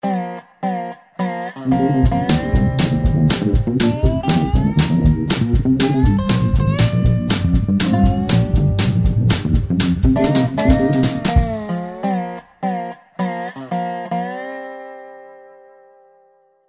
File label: Dobro Mash